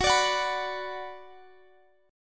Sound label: Gb Suspended 4th Sharp 5th; Gbsus4#5 strummed; x,x,16,19,19,19